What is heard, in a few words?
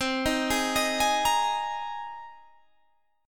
G sharp Major Add 9th C